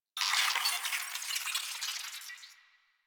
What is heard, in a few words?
Space Drone Enemy Spotted; Warframe; Corpus Space Drone